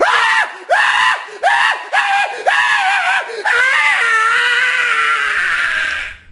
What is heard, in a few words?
male on fire; Voice; Neurotrauma; Barotrauma